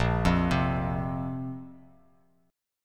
Bb Suspended 2nd, Bass Standard; Bbsus2 arpeggiated; x,1,3,5; JGuitar

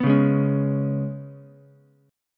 G sharp Minor Double Flat 5th G sharp, Ukulele; G#mbb5; strummed; JGuitar

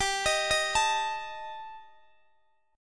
G Suspended 2nd Sharp 5th, Guitar DADGAD; Gsus2#5; arpeggiated; x,x,17,20,18,19

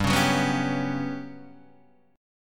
G Major 7th Flat 5th, Guitar, DADGAD

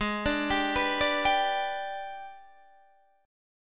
G sharp Minor Major 7th Flat 5th, Guitar Standard; arpeggiated; JGuitar

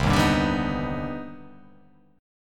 C Minor Major 9th, chord

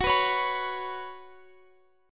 F sharp Suspended 4th, Guitar, Open F; strummed; x,x,18,18,16,18

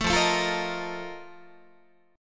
F 11th F, down, Guitar; F11 strummed; 12,13,12,15,14,14

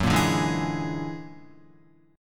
F sharp Augmented 9th F sharp Guitar; F#+9 strummed

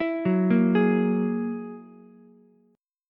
F sharp 9th F sharp Ukulele C6; F#9 arpeggiated

